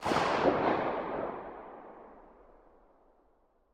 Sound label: echo hills